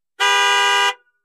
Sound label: Expedition Horn Honk, ford expedition